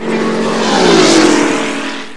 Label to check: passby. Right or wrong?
Right